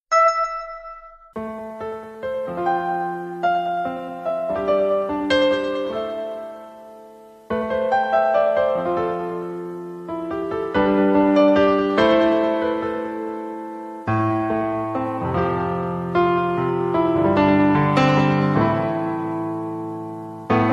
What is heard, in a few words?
piano version music for rhythmic gymnastics